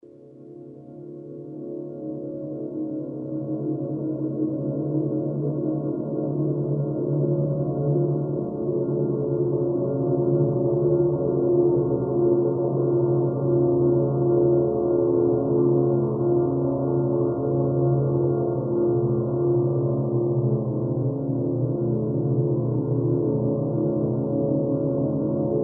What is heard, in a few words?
citadel hub ambience; ambient; hl2; levels